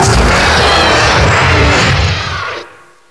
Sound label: flinch violent; tubequeen